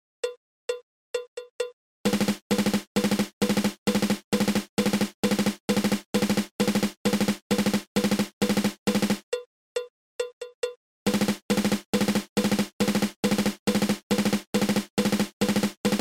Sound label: Single Stroke 4